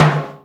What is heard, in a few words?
LOW TIMBALE; Greytsounds VOL-1; SDX PERC